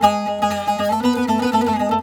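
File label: G#, bouzouki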